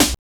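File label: HSE-SD, house snare drum; X-Static Goldmine 1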